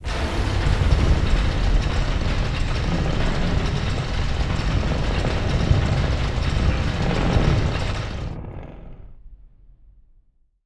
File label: cog statues initialise rotate; melody